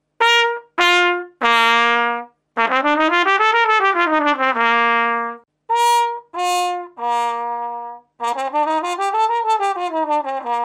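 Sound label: trumpet mute cup mute; faxx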